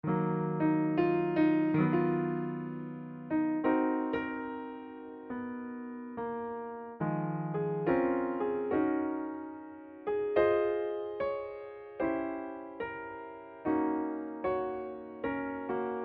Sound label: Midi